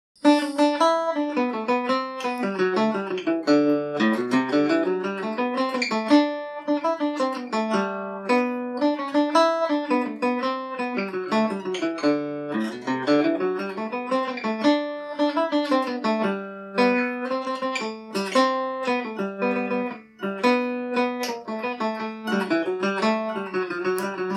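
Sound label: G major, mandolin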